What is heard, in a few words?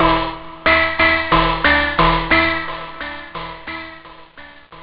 riff